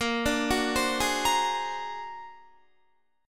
Bb Augmented 9th Bb, Guitar; Bb+9; arpeggiated

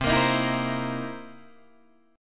C 7th Sharp 9th, Guitar, Open D

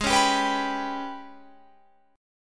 G sharp Minor Major 7th Double Flat 5th; x,x,18,18,19,18,21,20

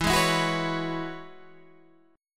E 6th Add 9th E down Guitar DADGAD

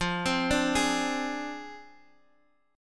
F Minor 6th; Fm6; arpeggiated; 13,15,12,13,x,x

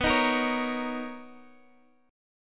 B Major 9th B, Guitar, Drop D

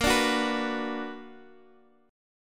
B Minor Major 7th Bb, chord

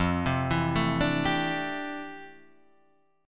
F Major 13th Guitar Half Step Down

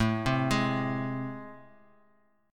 A Minor Major 7th, down; arpeggiated; AmM7; JGuitar

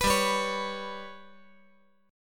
G Major Flat 5th chord

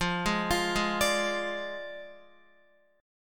F Minor 6th F down Guitar; Fm6; arpeggiated; JGuitar

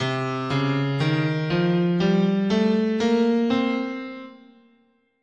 C Phrygian sharp 6, scale

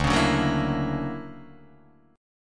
D Augmented Major 9th, Guitar, Open D

